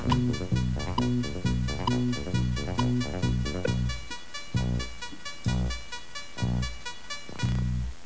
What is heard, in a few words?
Disco - Exit Windows; discotheme